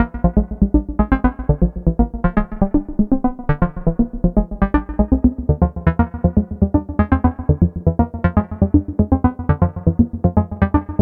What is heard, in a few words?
HP Filter FM via LFO; Dreadbox Chromatic Module; Ataxia